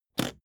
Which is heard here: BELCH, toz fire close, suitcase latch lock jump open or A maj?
suitcase latch lock jump open